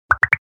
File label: Cartoon accent popping